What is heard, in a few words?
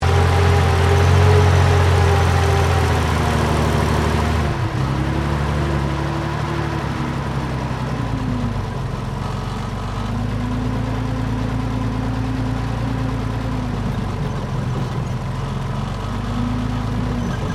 TANK CENTURION MANOUVER SLOW, British Centurian main battle tank; Truck Sound Effects; Trucks (Sound Effects:Vehicles)